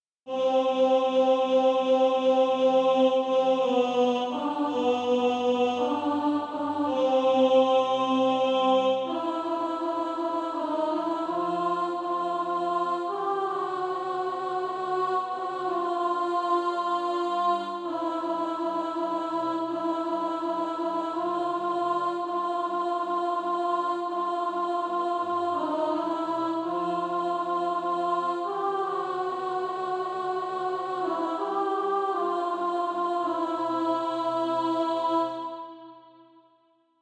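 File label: alto, Practise Music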